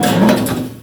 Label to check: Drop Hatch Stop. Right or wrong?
Right